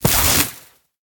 zap; devour 2021 gamerip pc macos

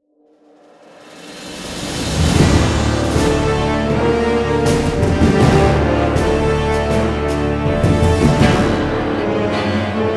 Bossfight Intensity Loop; The Witcher 3 Wild Hunt; Skellige

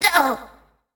pain; En lizwiz

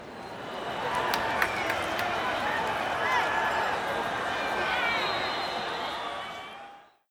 crowd huge outro no applause

